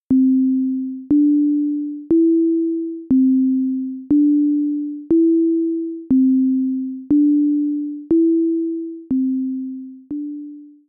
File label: ring